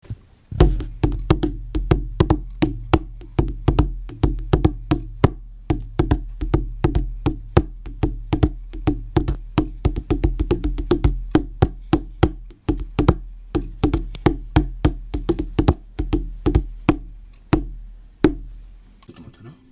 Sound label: kamitaiko